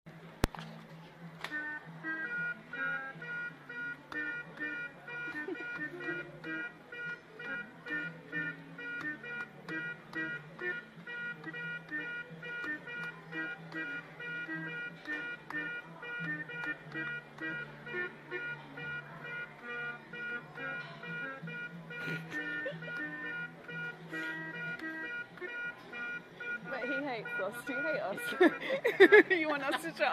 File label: jamming with the floor piano